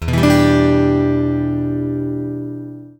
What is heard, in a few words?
Em7